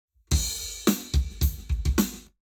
Drums, 90deg; eMagLS; Lab Wet

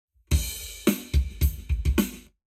Lab Wet Drums 90deg; eBreve

